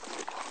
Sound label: spas idle, weapons; sci arms